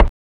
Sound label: Click; Synthetic's Serum Collection; Surge Sounds - ASTRO - Serum Wavetables